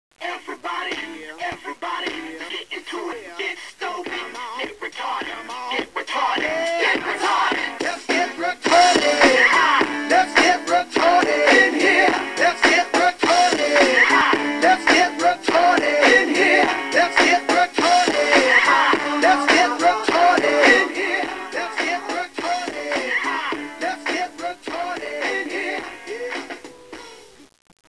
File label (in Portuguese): musica no carro